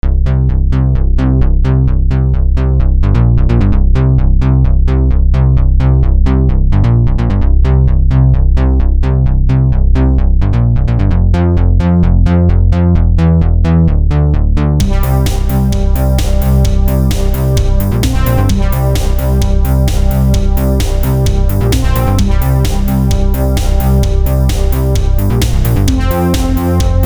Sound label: new wave cool music; ms paint edit promo